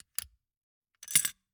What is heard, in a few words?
Gumball machine, candy door, plastic, open, drop, hyper; Eiravaein Works; Vaeyan IV